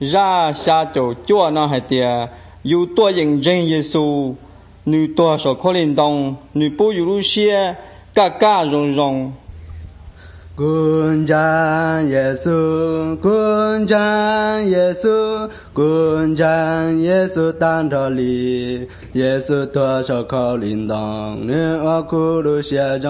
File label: songs hmong white